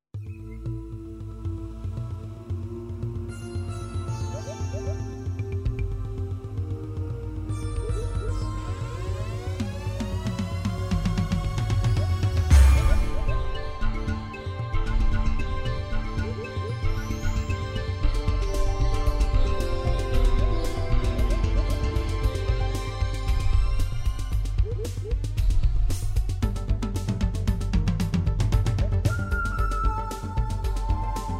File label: alesis; MIDI environment from the 1990’s